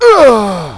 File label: pain; hostage